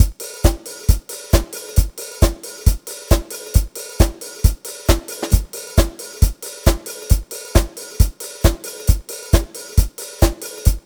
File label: Drum Kit, Electro Lounge; Sonic Foundry (Sony Creative Software); 135 Funky Air